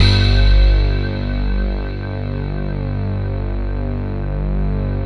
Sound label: SPECTRA G1; Greytsounds VOL-1; WS SPECTRA